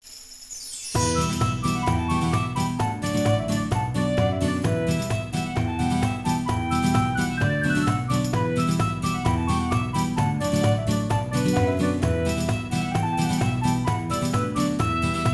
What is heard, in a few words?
Village festival; anno 1503 gamerip